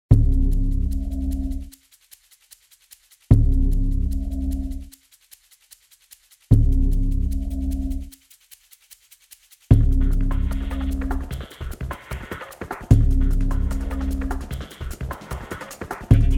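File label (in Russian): минута ожидания тревожная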